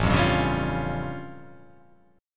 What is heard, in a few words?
A Augmented 7th A; x,0,3,0,1,2,3,2